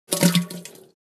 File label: Person having a poo pooping defecation into a toilet bowl